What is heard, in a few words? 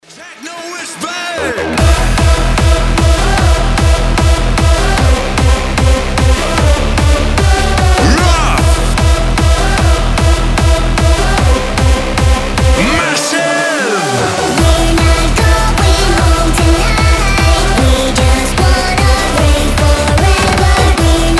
techno